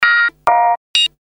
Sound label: electronic beeps